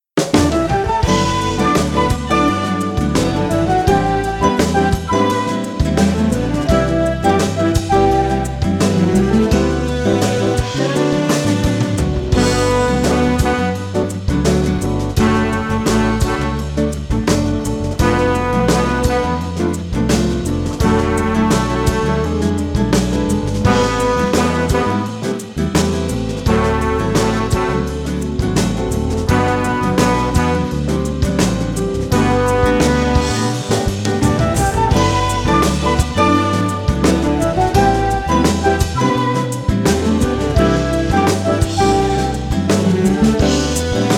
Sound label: flute